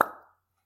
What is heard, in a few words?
plop, bubbles